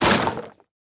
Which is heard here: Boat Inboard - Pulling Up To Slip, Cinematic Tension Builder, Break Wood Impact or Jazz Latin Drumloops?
Break Wood Impact